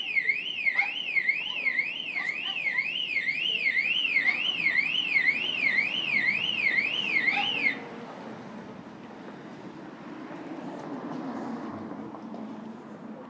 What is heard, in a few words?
dog set off car alarms